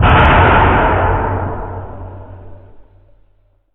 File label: hit